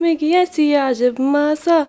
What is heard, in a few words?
GIRL, GIRLVOICE, Arabian Traditions; USB Soundscan vol.43; AKAI